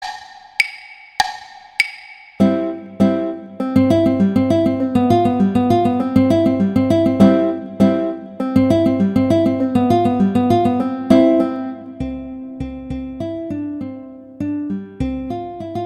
Andantino Violin; Paganini; Sonatas Op1 Vl Gu; Sonata No 4

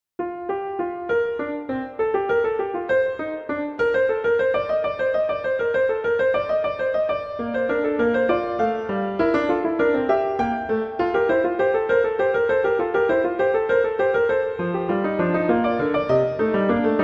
Fugue; Bach; Prelude Fugue XXI

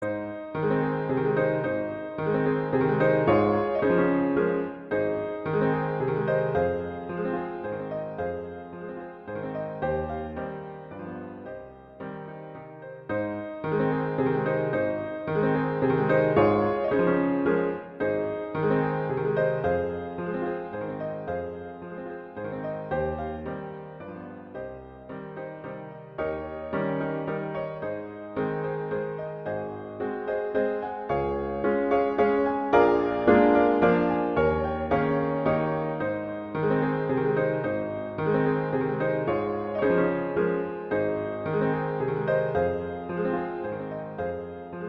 Waltz, Lullaby; Brahms; Waltzes

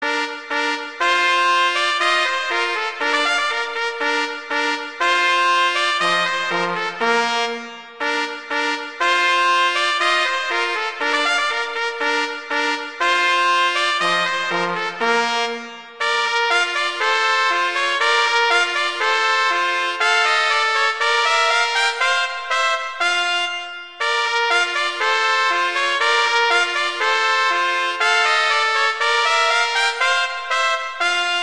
Duet; Mozart; Duets

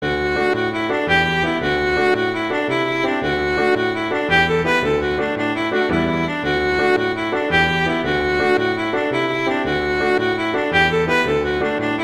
Jig; Fiddle Collection; Fox Hunters Jig

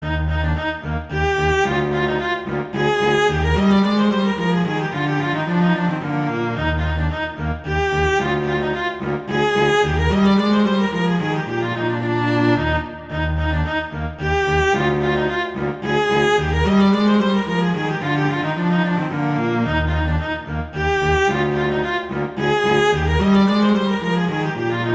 Duet; Mozart; Duets; Vla

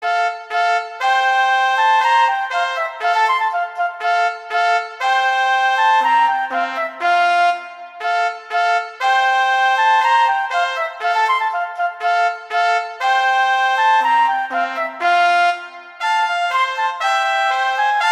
Duet; Mozart; Duets

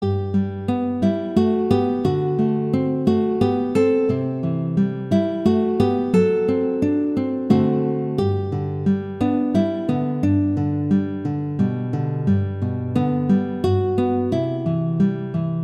Moderato espressivo, Serenata; Toselli

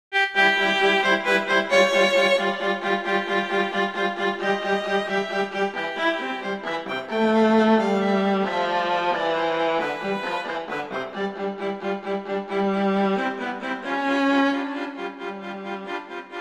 Rondo, Allegretto; Mazas; Duets; Duet 1

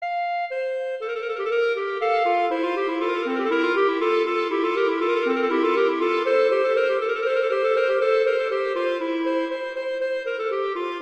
Allegro; Telemann; Canonic; Sonata 2

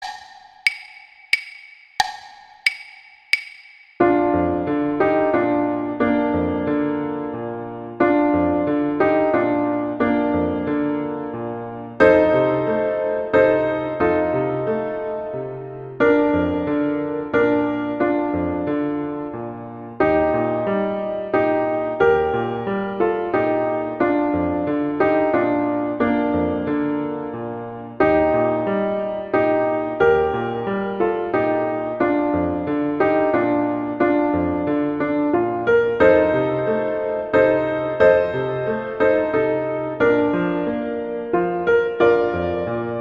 Silent Night acc; Gruber

